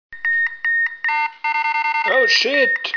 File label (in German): Stallalarm